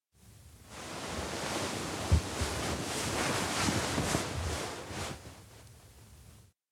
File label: futon sit up; anemoi